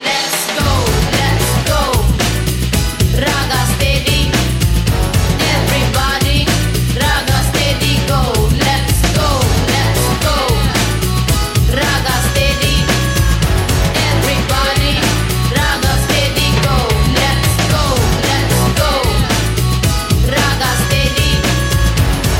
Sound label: ragga steady